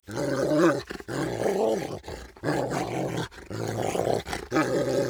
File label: growl; Rust